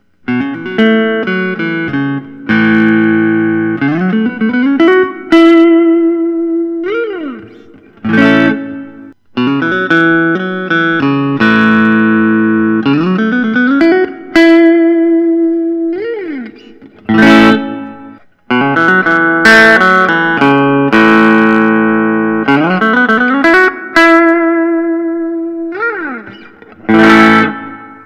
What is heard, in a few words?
thorn deluxe 90 brazilian; caramel burst